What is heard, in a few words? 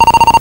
fast bleep